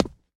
concrete step; Original TK Sounds

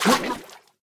wall drown; Golden Crayon Sounds

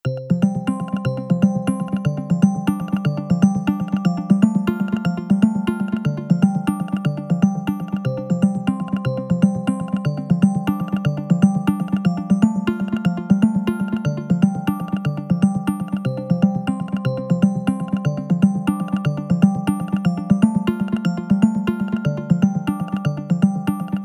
Breaking, Ringtones; iOS 17 Tones

